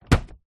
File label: food flour packet large set down on floor